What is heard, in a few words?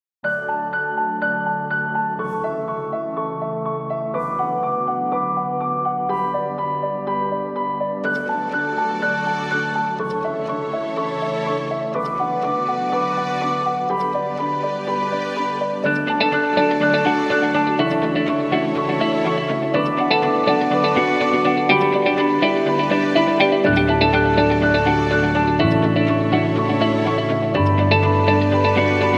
Uplifting and Inspiring Corporate, corporate music; corporate music vol 15